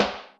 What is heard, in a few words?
Filtr rim, Electric Snare, Yamaha DTXpress